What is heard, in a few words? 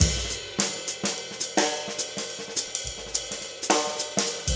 NuJazz Swing Drums, Jazz; Digidesign; Pro Tools Essential Demos; Essential Loop Demos; Demo Session - Jazz